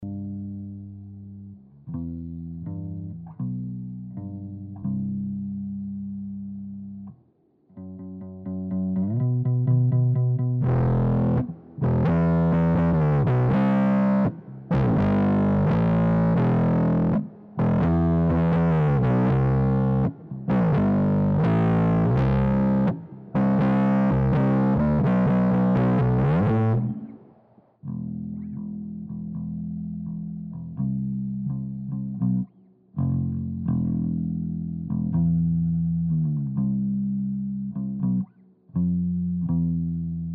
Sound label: bass dist